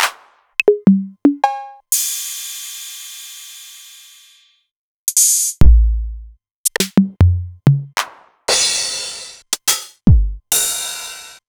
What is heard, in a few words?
Novation Drumstation; DrumstationV1